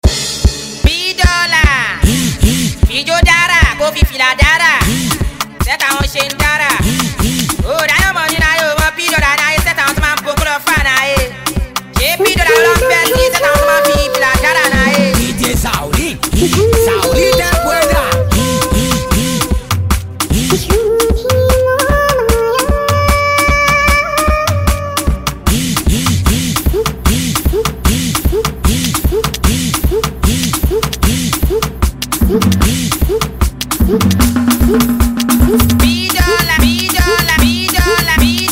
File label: Cruise Beat